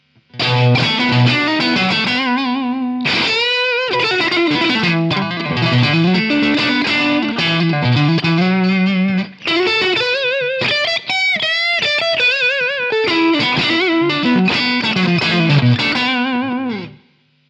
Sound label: super reverb on